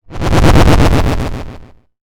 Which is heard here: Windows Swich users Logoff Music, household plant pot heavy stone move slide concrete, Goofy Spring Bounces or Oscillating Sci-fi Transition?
Oscillating Sci-fi Transition